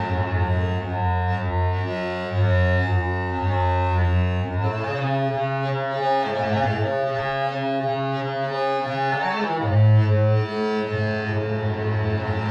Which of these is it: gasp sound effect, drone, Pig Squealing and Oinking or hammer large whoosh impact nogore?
drone